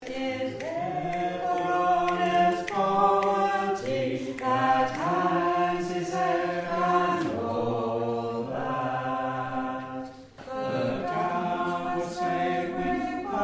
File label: Bass, singers